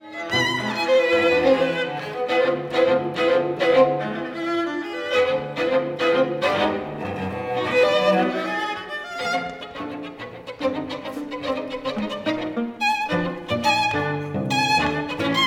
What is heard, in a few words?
string quartet; first quartet